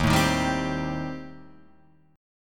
Eb 6th Flat 5th Eb down; Eb6b5 strummed; JGuitar

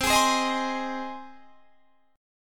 C Minor Sharp 5th C down; Cm#5 strummed

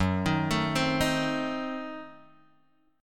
F sharp Diminished 7th F sharp Guitar Drop D

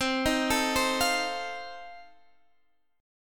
F 7th C

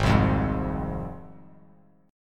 G sharp 6th G sharp down, chord; strummed; JGuitar

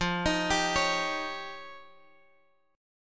F 7th Suspended 2nd Sharp 5th, Guitar, DADGAD; F7sus2#5; arpeggiated; 15,18,17,18,x,x

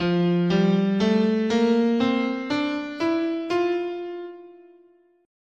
F Ionian, scale

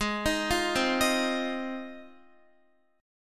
Ab 6th Ab, Guitar; Ab6 arpeggiated

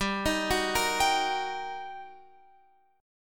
G Minor Major 7th Sharp 5th, down, Guitar, Open F; GmM7#5; arpeggiated

